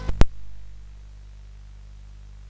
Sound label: sid CSG8580R5; Gryzor; hvsc